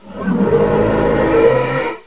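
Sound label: dino roar; Pamela Anderson Interactive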